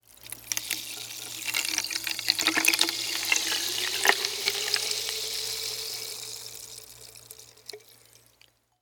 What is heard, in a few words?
food drink champagne pour into pitcher; martinimeniscus